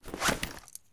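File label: weapon mag in rig; Escape from Tarkov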